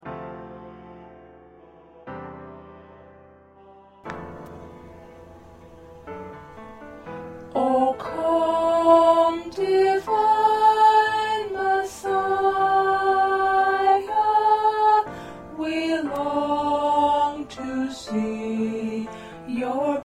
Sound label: Alto